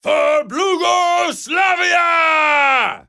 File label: charge